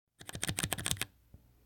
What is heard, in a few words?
ring fast